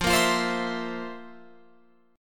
F Major 7th Suspended 4th, Guitar, Open F; strummed

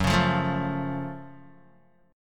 Gb Minor Major 7th Gb, down, Guitar; strummed; 4E,4A,5D,5G,5C,6F; JGuitar

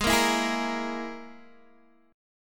Ab Suspended 2nd Sharp 5th Ab down Guitar DADGAD; strummed; JGuitar

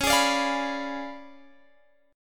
Db 7th Flat 9th; x,16,18,19,18,19; Db7b9 strummed

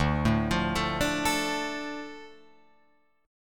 D Minor D Guitar Open F; 2,4,5,4,5,4; Dm arpeggiated

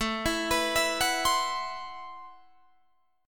A Minor 6th Add 9th, Guitar Open D

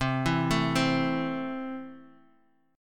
C 6th C down Guitar; arpeggiated

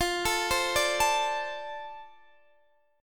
F 6th F down Guitar DADGAD; F6 arpeggiated

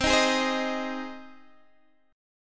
C Minor Sharp 5th, Guitar, DADGAD; down; chord shape

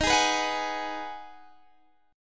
Ab Minor 7th

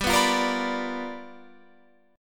G 7th Sharp 9th G chord, Guitar Open F; G7#9 strummed

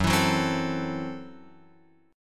Gb Minor 7th Flat 5th Gb Guitar Open F; Gbm7b5 strummed; x,1,4,1,3,4

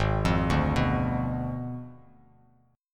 Ab Diminished 7th, Bass Standard, chord; Abdim7 arpeggiated; JGuitar

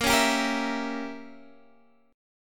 A sharp Diminished 7th, Guitar Half Step Down

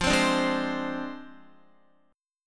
F Minor 6th F down Guitar

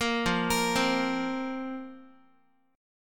F Suspended 4th F, Guitar; Fsus4; arpeggiated; 13,13,13,10,x,x

